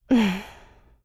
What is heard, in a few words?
laugh; Eternal Return; Katja; ENG